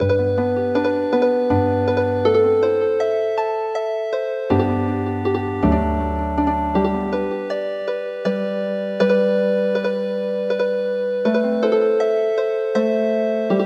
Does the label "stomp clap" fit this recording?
No